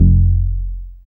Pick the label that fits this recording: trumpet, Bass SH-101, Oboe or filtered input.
Bass SH-101